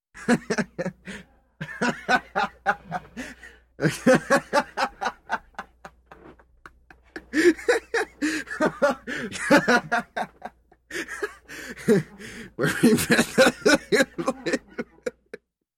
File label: Young Man Laughing